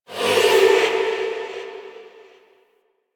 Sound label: Evolving Weapons UI Node Select E; Zariman